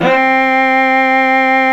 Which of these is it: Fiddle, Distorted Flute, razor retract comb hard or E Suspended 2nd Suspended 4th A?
Fiddle